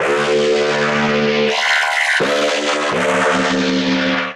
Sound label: Darkstring A Minor 165 bpm, pads; MIDI Clips; Tonal; Skitter and Step; Ableton Factory Packs